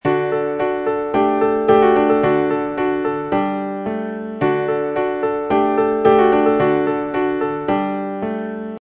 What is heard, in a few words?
PIAN 110, Piano loop 110 bpm.; Keyboard Loops